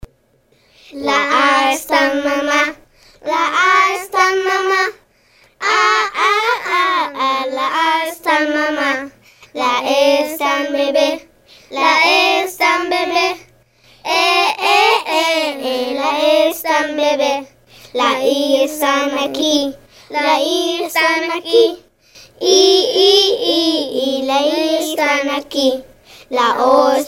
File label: Spanish Song